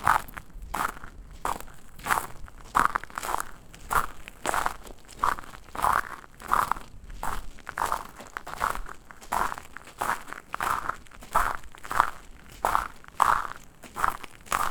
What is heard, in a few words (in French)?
pas sur un chemin en, exterior